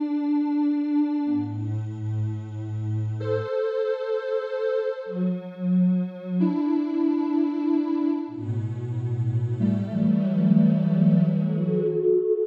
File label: deathly ambient ish remix; ambient ish stuff